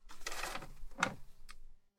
branch creak

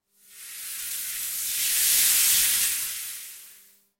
wind gust

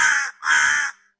crow caw

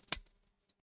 twig snap

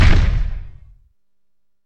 fortress hit

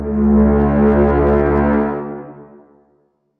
wave start

